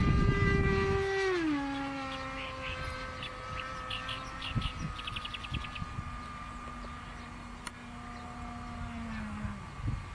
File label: f16 landing